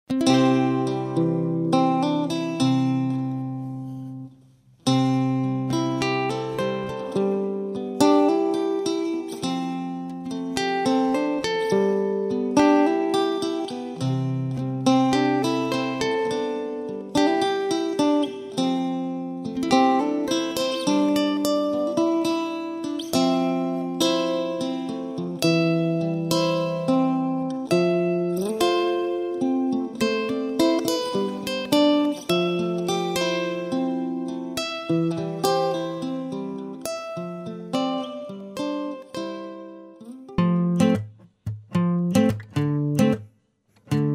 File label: Ambiance Guitar